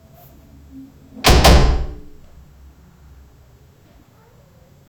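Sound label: door making squeaking; doors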